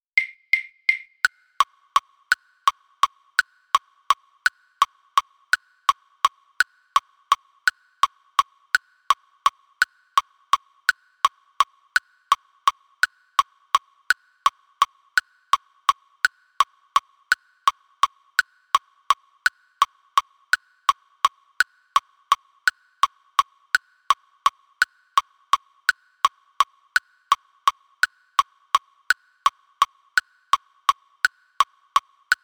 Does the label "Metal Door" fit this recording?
No